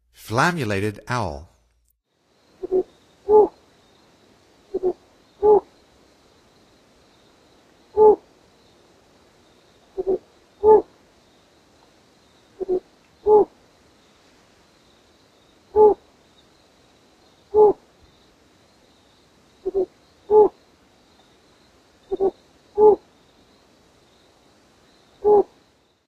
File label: Flammulated Owl; North America; Stokes